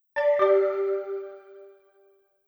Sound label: Ringtone; Devilman Crybaby Original Soundtrack